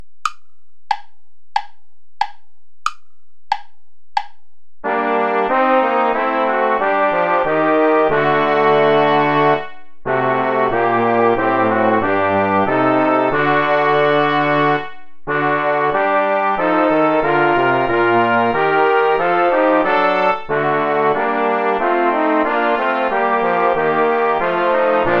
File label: play along without trumpet